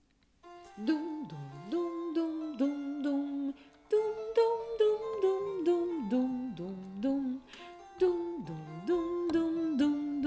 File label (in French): Gavotte Basse